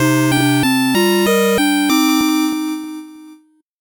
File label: 8bit jingle fast